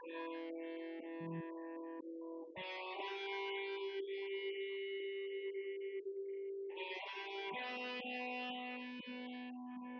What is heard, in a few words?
wdrums synthconv; SiSEC08; SiSEC underdetermined